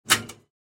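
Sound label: sounds of turnstile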